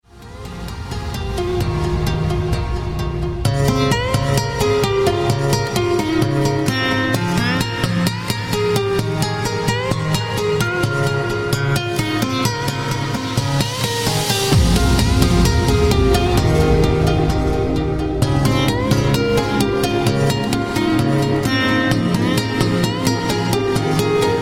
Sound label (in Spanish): la guitarra